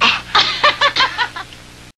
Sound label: laugh guy girl; ooh ahh